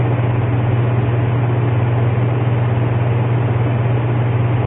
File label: air conditioner loop; ambient; machines; Source Engine; cstrike